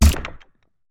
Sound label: UI Stop; Seven Seven Seven